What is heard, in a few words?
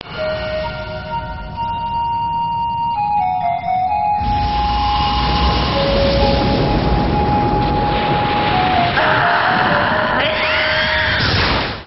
flute; sailor moon super s movie - neo tuxedo mask; SailorMoonSM; deskThemes